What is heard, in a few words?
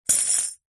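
percussion tambourine kids set down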